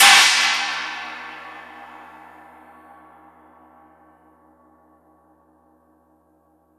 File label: Cymbal China Long; Lightning1024; pitchglitch